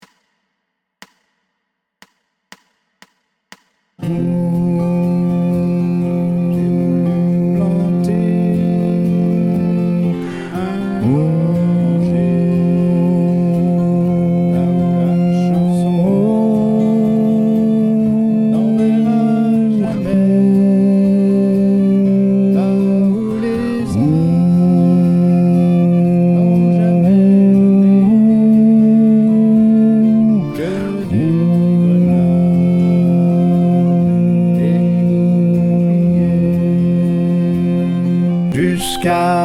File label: Tenor